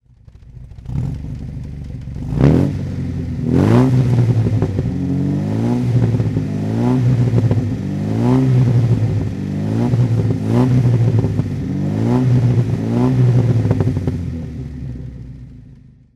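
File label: austin healey throttle, engine sound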